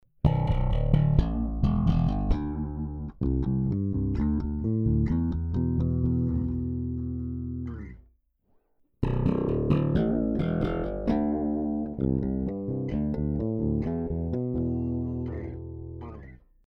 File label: bass; phil jones bass bighead mobile